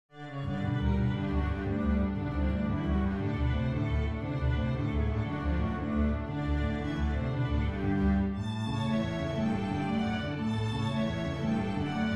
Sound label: jarabe; jarabe full